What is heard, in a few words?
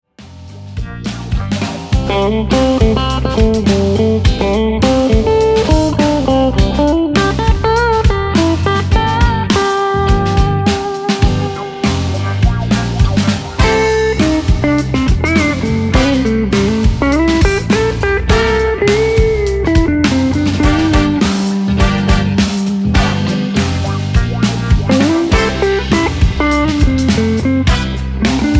Funky, instrumental